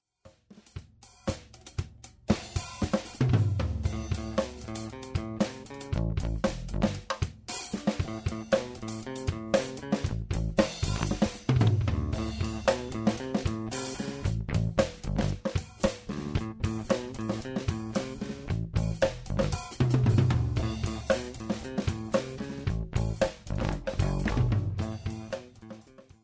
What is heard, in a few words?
victory pick, bass